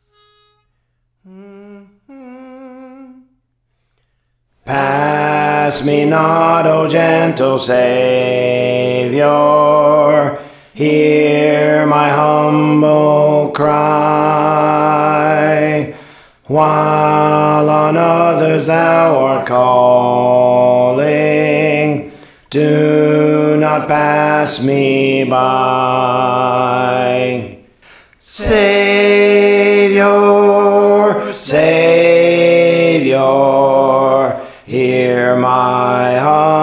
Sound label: Alto, Praise For The Lord Training; Pass Me Not